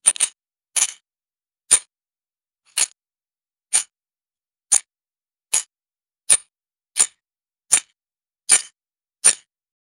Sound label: digging game gem collecte; mobile